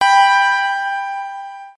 kart start; kart race

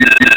spawn; slender; zerosiki

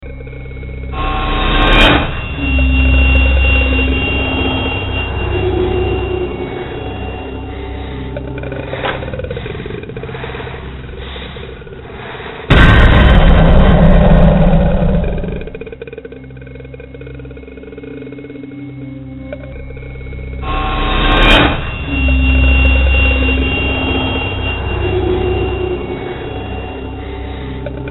funny alert theme; slender; zerosiki

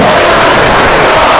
death; cartooncat; slender

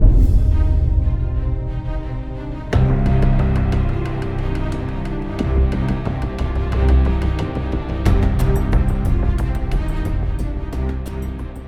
start round; music; sudden attack; tfgo

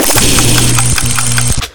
battle cry; zombiesurvival

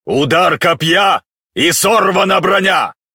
warcry; hellagur; zombie riot

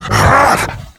death, male zombie; nmrih; zerosiki; slender

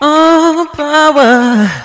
spy laugh short; spy jackson

